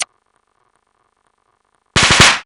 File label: toss